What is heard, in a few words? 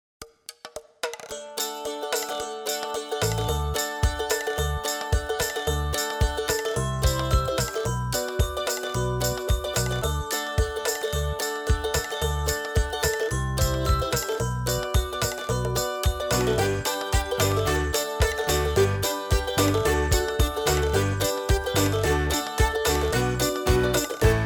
Laughter Song (Instrumental); My Little Pony Friendship is Magic; Season 1